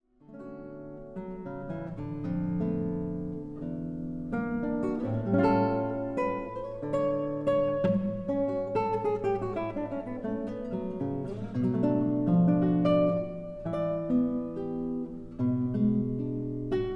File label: my guitar story